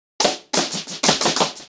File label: 90BPM, acoustic drums; The Roots Drumloops